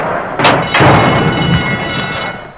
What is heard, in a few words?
starting gate; Motorola MPX200